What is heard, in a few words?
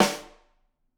Rim, drum